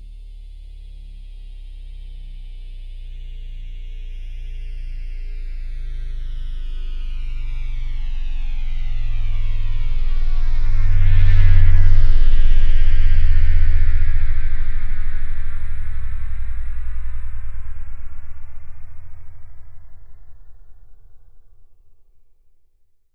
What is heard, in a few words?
LANDING, FLY OBJECTS; AKAI Anime Sound FX